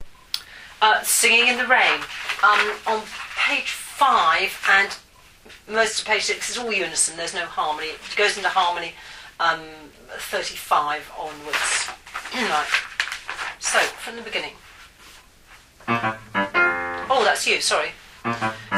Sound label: Singing Sop 2